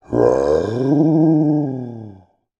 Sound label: horror werewolf vocalisation